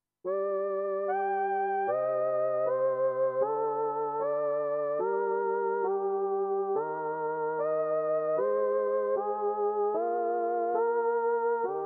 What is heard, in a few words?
Analog Voice; XG